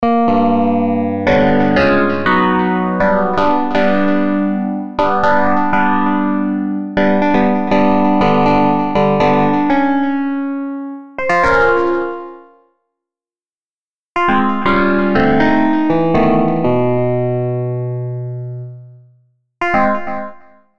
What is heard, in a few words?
Piano